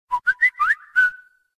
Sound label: whistle; whatsapp